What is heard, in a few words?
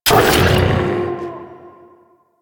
Sniper Mech, Weapons; DE; Warframe; Tenno; Quad Sniper